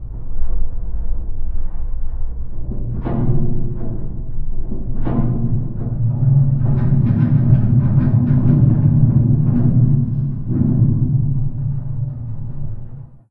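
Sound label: Metal Distant Groan Loop; Silent Hill Homecoming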